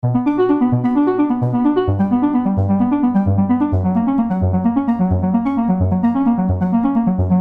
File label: ARP Soft; sickrate; siik essentials iv